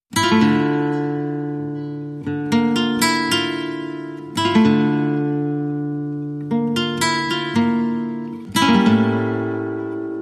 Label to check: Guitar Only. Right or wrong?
Right